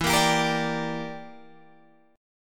E Minor 7th Flat 5th E, Guitar Open G

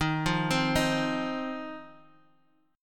C Minor Double Flat 5th D sharp

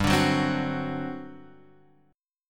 G Major 7th Suspended 4th, Guitar, Open F; strummed

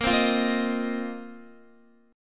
A sharp Suspended 2nd Suspended 4th down Guitar; A#sus2sus4 strummed

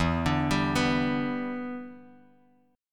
E Minor 7th, down, Guitar, Drop D

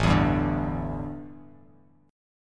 A-7th Suspended 4th-A-5,7,5,7-1-down; A7sus4 strummed

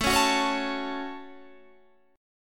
A 6th, Guitar, DADGAD

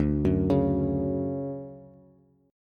D-Major 7th D down Bass; arpeggiated; JGuitar

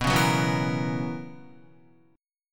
B Major 7th Suspended 2nd Suspended 4th B Guitar; BM7sus2sus4; strummed; JGuitar

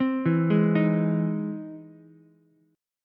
E Augmented Major 7th E down Ukulele; arpeggiated; E+M7; JGuitar

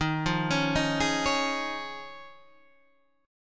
F Minor 7th Sharp 5th, D sharp

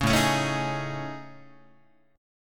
A Major 7th Flat 5th, down Guitar; AM7b5 strummed; 4,5,4,7,7,7; JGuitar

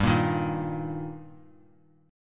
G Minor Sharp 5th G, chord; strummed; JGuitar

